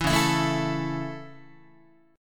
Db Minor Sharp 5th Db down Guitar; strummed; Dbm#5; JGuitar

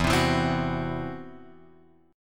E Suspended 2nd Sharp 5th; Esus2#5 Chord {0 3 2 X 1 2} strummed

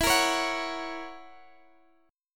F sharp Major E; F#/E; strummed

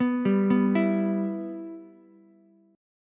E-Minor, Ukulele; arpeggiated; JGuitar

